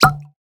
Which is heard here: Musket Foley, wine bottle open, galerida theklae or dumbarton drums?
wine bottle open